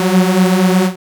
BLAST Gb2, dubstep; Jack Sparrow; Deep Dubstep Progressions

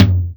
Toms

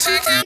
B VOX STAB; funky; Loopmasters; Funky Underground Vol 2

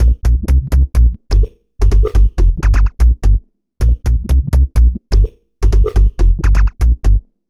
126 Bb combi groove; Loopmasters; Funky Underground Vol 2; COMBI GROOVES

